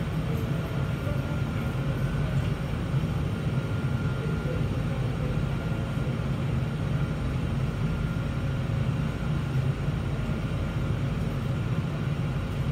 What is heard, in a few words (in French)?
ambiance, Metro